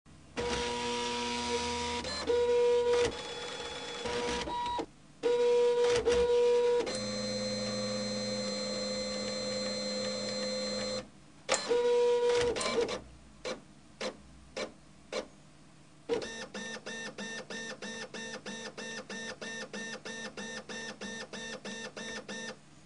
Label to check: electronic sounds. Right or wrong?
Right